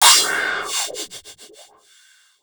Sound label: PROCYMB, CYMBALS